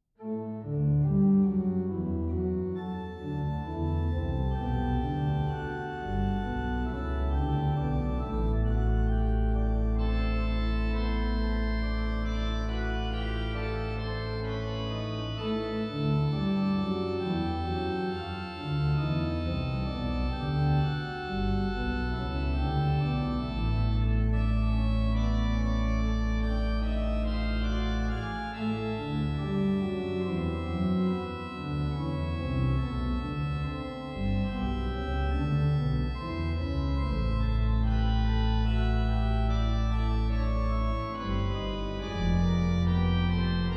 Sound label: chorales, organist